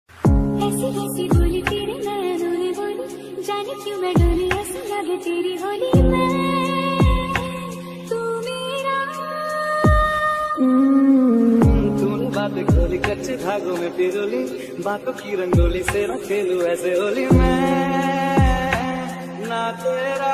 instrumental new ringtone instrumental ringtone song instrumental ringtone flute